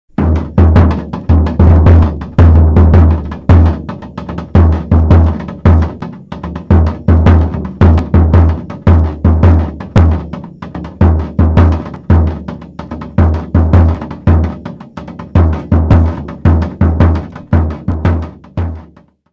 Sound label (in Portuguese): instrumentos zabumba; cocos